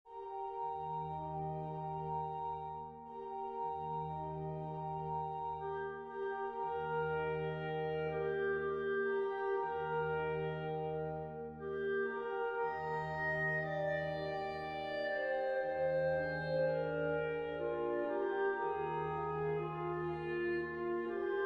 cradle song celebre ninna nanna trascrizione da concerto per grande organo; brahms johannes